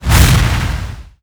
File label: Shoot Fireball